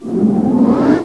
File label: Teleporter Open